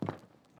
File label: Step; This Is the Police 2